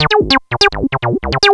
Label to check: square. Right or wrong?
Right